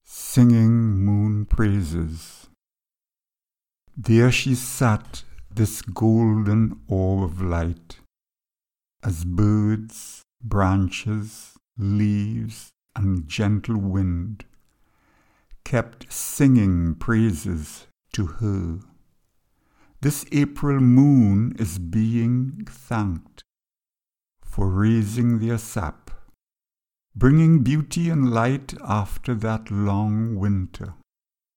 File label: Singing Moon Praises